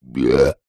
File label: human male 37 years old burp